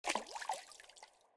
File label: Water Hit